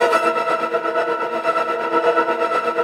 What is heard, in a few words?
SUPER TREM; Distored Reality 1&2